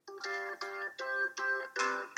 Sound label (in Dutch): Muziekfragment Oldies